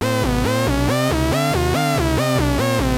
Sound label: analog fx, synths; Rubber Chicken Software Sample Bank